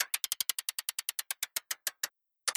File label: upgrade scrolling; my Buildings; City Verse Tycoon